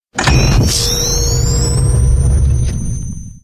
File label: Ghostbusters Proton